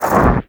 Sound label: Void Exit; Warframe; Focus; Operators; Operator Wraith Movement